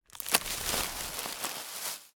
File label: plastic bag